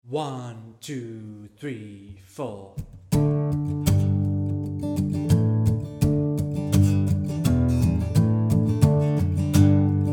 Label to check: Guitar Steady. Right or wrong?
Right